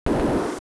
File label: Door Unlock; LOZ